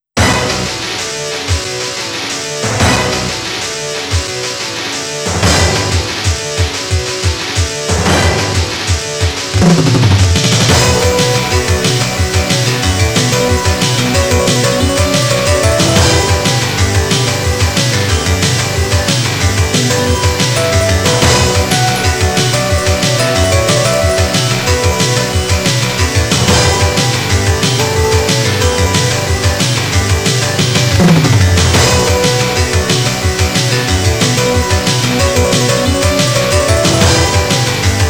Final Battle; NMK Arcade Sound Digital Collection Vol. 3; Rival

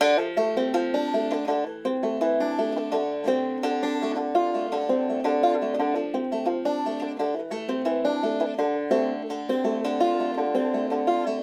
String

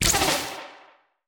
Fire; portal quest android; Antihero Skill1

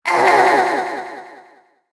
lizwiz death

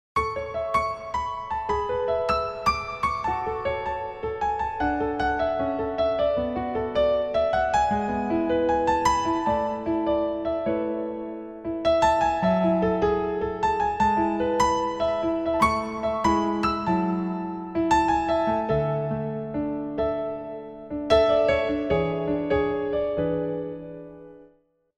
Story sad; pangya portable original sound track 2008

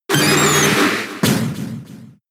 Roar; pokemon sfx gen 6 attack moves xy oras

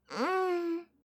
Moan; My Cute Commissar

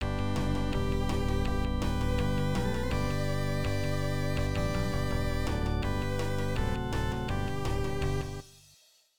electone; Great Sluggers 94 New World Stadium; namco nb 1; arcade

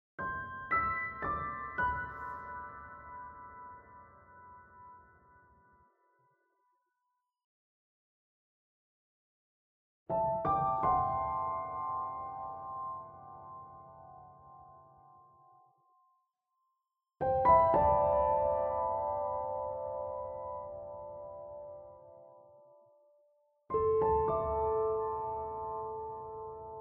Layer Piano

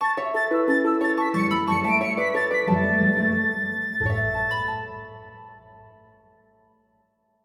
offer completed; Grand Exchange

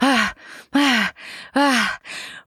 Moan REV; Anna

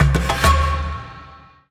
Music stings Overblown

